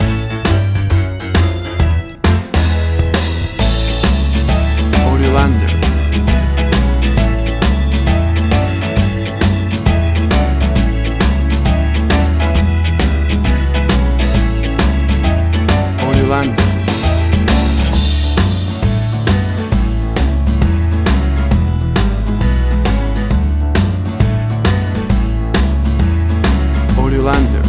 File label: 50s Country Boogie Woogie